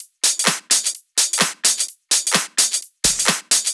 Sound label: Drumloops 128BPM